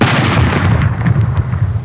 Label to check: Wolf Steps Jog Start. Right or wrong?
Wrong